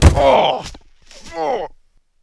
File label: pain, human